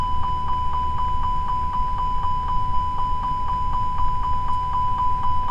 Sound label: FIRE TEST APU, Aircraft A320 321